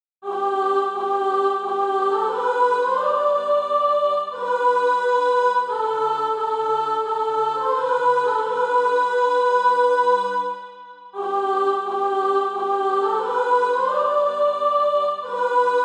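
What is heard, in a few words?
soprano, SATB Practise Music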